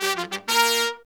Horns, 96BPM; 90 s Sample CDs; Zero-G; Phantom Horns

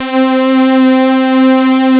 POLY BRIGHT, JV 1080; Pro-Rec - Synthsonic

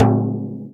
FRAME, INDIAN PERC; Big Fish Audio - Didgeridoo and Other Primitive Instruments

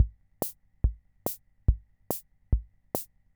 Keynote MR-101 Auto Rhythm Loop; Keynote MR-101 Auto Rhythm Sample Pack

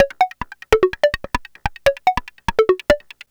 Percussion; Drums & Percussion; Electronic Point Blank

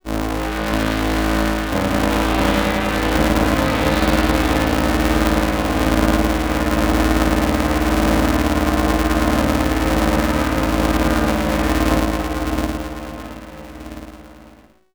Demons Pad; Sonic Foundry (Sony Creative Software); Loop Noir

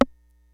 LIVE AC KIT, DRUMS; Kid Nepro; Elite

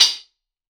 Soft Crystal Staccato, Hats; Airwindows

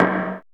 pallet perc; Drums In Your FACE!

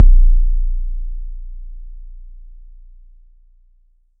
XV Kick, Roland XV-5080

